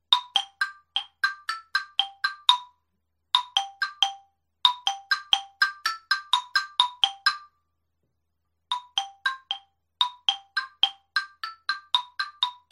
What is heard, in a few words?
Marimba